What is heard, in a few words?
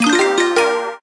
super meter joker win; mega joker